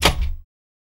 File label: Door Push Bar Door Cl